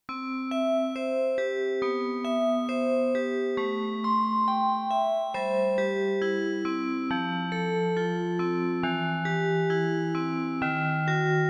Vibe Bells

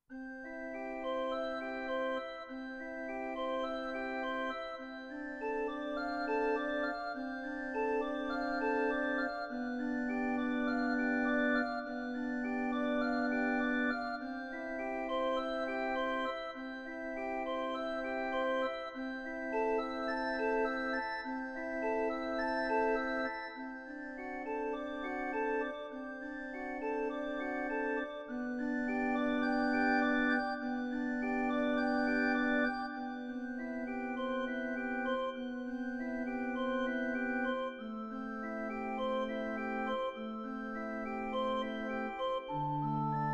Pipe Organ Flute

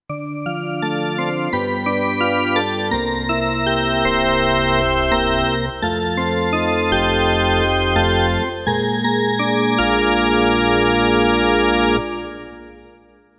Det Draw Organ Organ

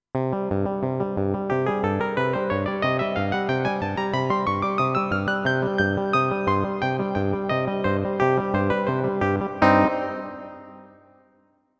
Pulse Clavi

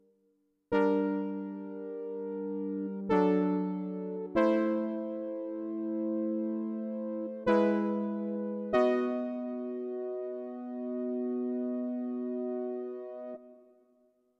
Ana Vel Brass

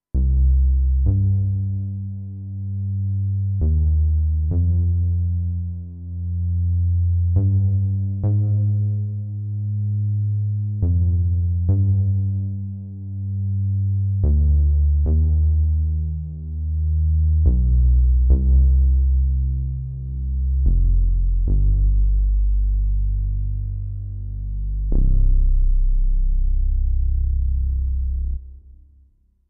Modulr Sy Bass

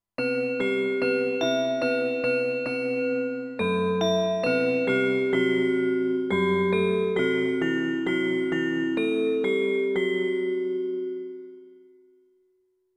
Round Glocken

